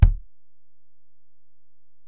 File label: Ac BD, Alesis DM-PRO; 300 Drum Machines